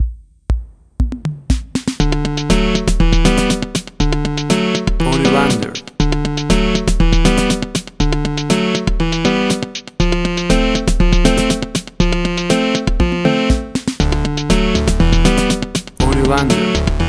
Casio Supernova

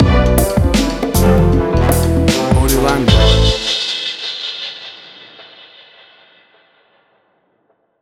Upbeat Orchestral Hip Hop Stinger; An upbeat hip hop beat using h; AUDIOLANDER

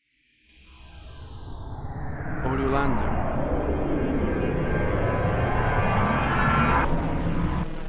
Alien Sweep Lo; low

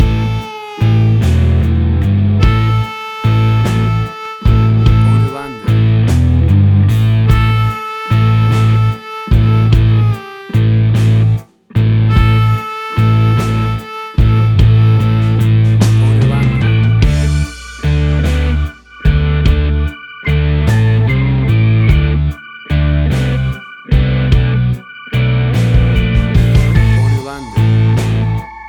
Blues Bar Angst

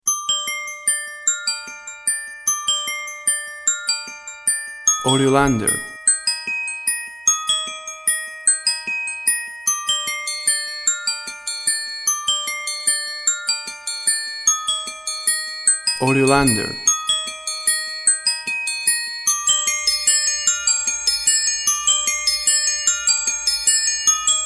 ouverture bells

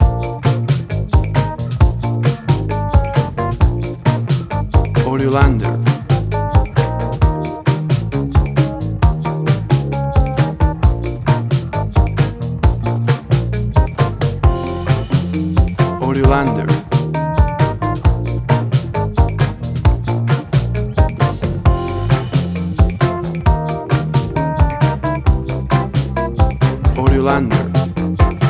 Playful Comedy

